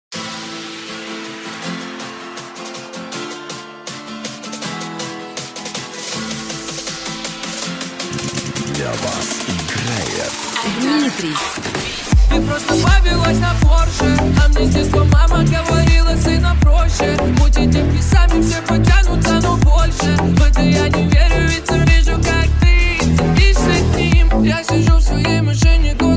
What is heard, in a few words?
instr. Type Beat